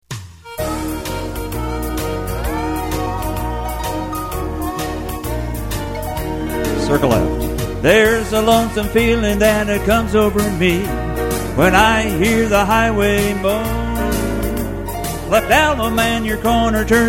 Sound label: vocal